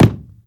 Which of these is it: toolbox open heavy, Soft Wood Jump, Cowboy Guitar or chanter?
Soft Wood Jump